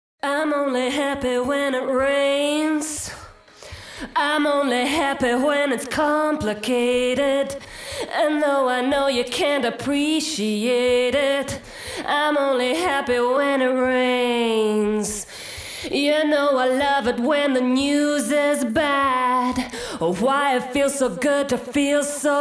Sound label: vocals